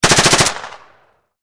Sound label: MP5 long burst, Submachine Gun MP5 Long Burst; Machine Guns; VRSonic